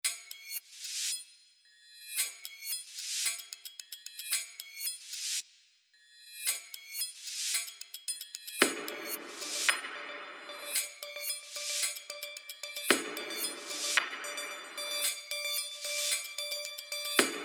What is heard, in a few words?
small rhythmic, suspense